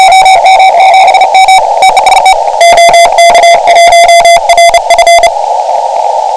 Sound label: 7MHz